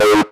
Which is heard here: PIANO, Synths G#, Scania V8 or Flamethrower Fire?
Synths G#